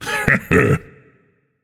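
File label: non-verbal heh heh; smite; atlas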